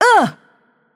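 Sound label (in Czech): Attack Heavy, Czech; VO; cs CZ; bloom minigame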